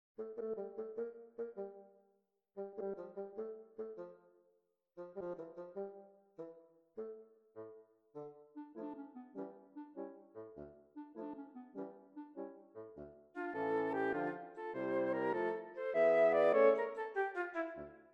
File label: Funeral March